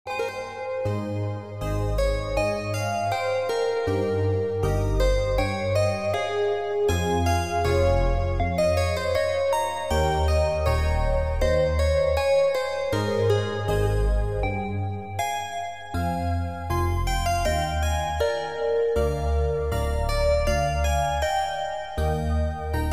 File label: Piano Concerto In F Minor